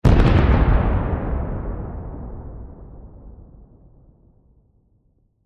Explosion Blast Black Powder Large; Military; Warfare; Blastwave

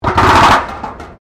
METAL BIN SCRAPE CONCRETE; Materials

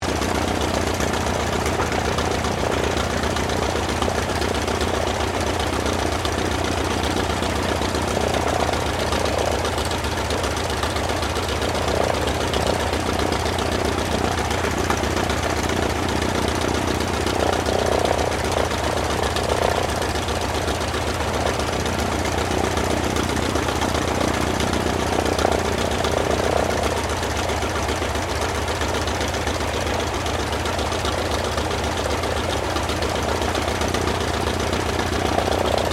AIRCRAFT CA-28 CERES IDLE

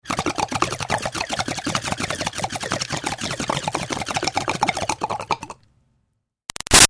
Bong Water Pin Out Inhale Bubbles Long; Nature

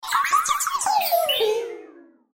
Robot Malfunction, Robot Malfunction Power Down; Cartoon

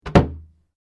Door Pivot Wooden Closet Understairs Close; Various doors; Miscellaneous Doors

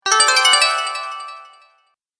Bright Stage Complete; Video Game Sound Effects

